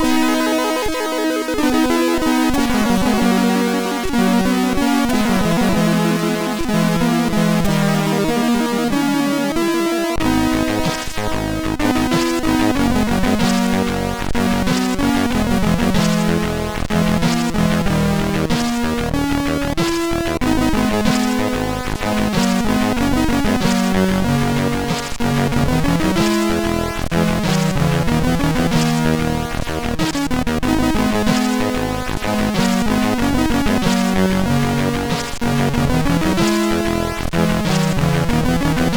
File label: sid MOS6581R3; Torreadors Dream